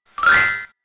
comic magic; geraeusche virtuell

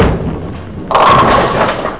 bowling

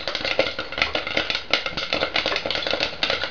POPCORN

